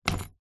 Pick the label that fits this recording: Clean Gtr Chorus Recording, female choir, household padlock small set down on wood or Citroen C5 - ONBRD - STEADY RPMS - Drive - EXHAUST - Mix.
household padlock small set down on wood